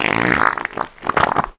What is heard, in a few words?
fart medium messy; Farts